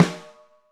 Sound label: Alesis DM5 Snare; drums